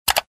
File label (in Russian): odin klik myshki